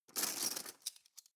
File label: cutlery teaspoons drawer grab soft; objekte; besteck